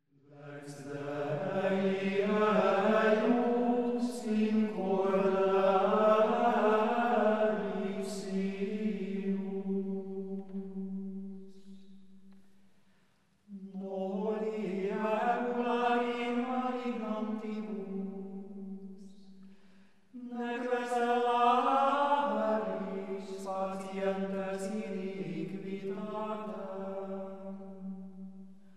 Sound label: Gregorian chant